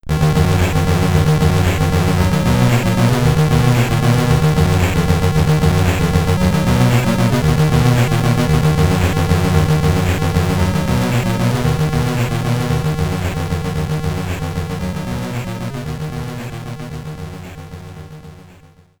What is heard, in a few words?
Boss Theme, SID Stereo; terra cresta commodore 64